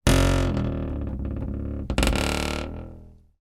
household door creak wooden hollow

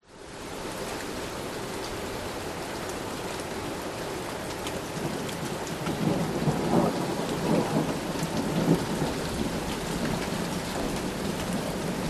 nature thunder distant rumble light rain wind

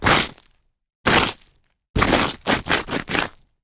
foley footsteps gravel scrape drag various

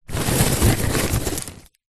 food popcorn paper bag full hand in rummage

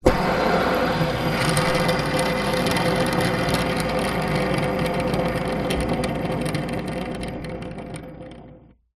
leisure toy fidget spinner metal spin on table; zapsplat

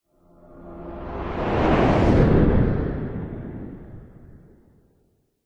science fiction craft race past zoom fast; zapsplat

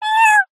animals cat kitten meow; zapsplat

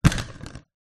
household toilet roll holder empty roll spin

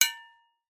impact metal light resonate

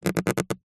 rubber squeak; zapsplat

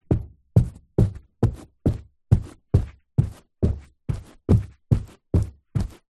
foley footsteps boots carpet wood floor walking; zapsplat

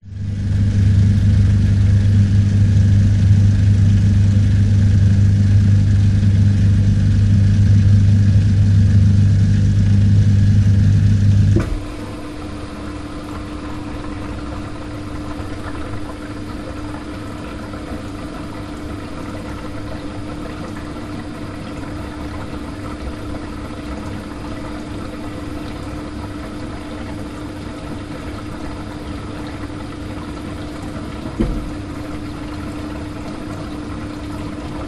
household dishwasher operating close up

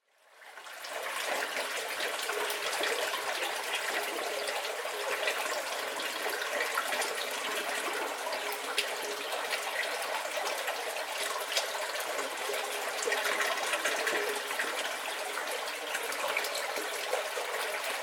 Water Stream Pipe Scotland; noise creations

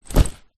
backpack small empty down on carpet

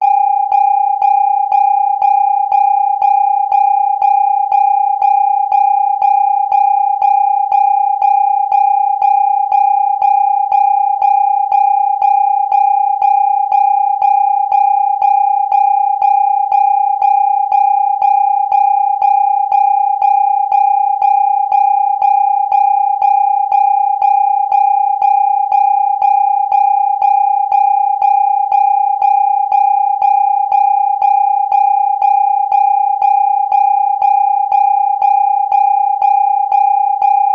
emergency alarm beep tone warning; zapsplat

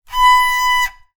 transport bicycle brake squeak loud; zapsplat

